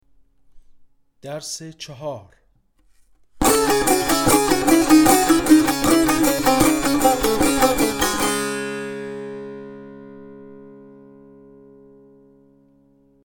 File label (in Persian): مشق تنبور; تنبور جلد دوم; هفتاد و دو مشق تنبور